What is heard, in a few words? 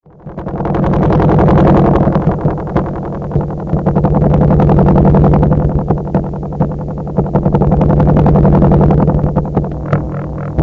huh